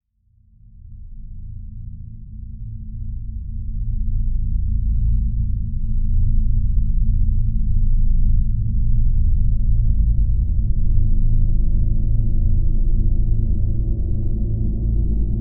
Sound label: Drone Dark Phase Reverb Slow; Blastwave